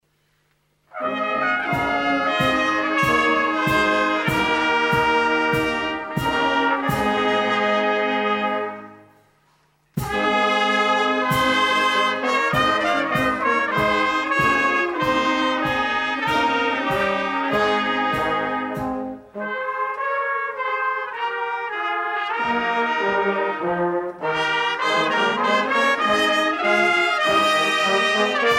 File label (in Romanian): Fanfara